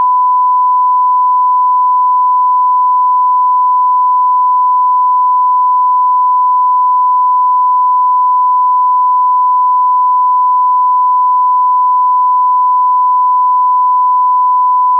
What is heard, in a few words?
sine 1khz stereo